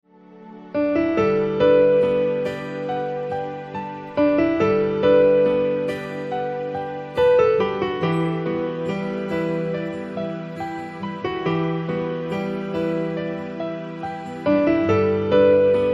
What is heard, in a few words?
sounds of guitar